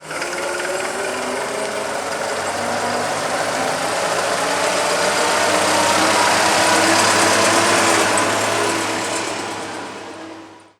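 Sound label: tilling stevens, bus; 1930; Simutrans; Pak128.Britain-Ex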